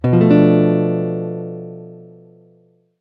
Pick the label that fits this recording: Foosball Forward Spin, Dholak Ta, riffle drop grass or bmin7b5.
bmin7b5